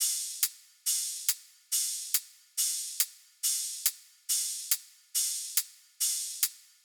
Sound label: Riddim Drum Loop Top 140; Drum Loops; Ghosthack; Riddim Essentials 2020